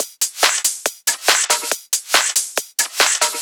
drum Loop 140BPM Full

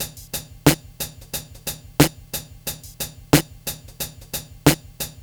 BEAT, Burning Grunge Hip Hop

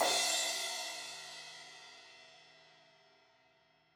Crash 16in edge, Cymbals; Deeply Sampled Drums

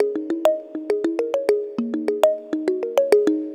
Tongue Mallet; Melodic; Ambient-Melodics-II

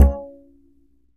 Arp, UPRIGHT BASS, A STRING; Upright Acoustic Bass

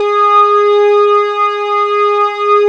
G#3 SYNTH, SYNTH ORGAN; AKAI S-Series CD-ROM Sound Library VOL-7

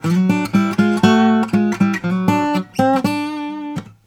Blues Guitar Samples; ILIO; Fretworks; LICK

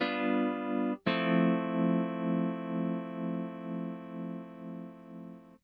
Hip Hop and R&B Drumloops; Best Service ProSamples vol.01; AKAI

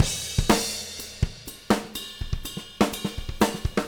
124 CRSH, Living Drums

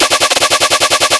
BASS SHOT CHAINSAW NOISE

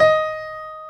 D#4 STR PIA L, G.PIANO; AKAI S-Series CD-ROM Sound Library VOL-7

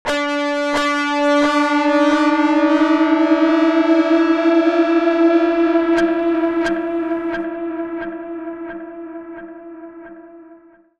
FX DIST; FX DISTO

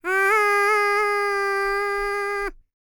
PERSIAN, World Voices; ILIO; Vocal Planet VOL-5

